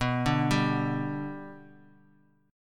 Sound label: B Minor Sharp 5th B down; arpeggiated; JGuitar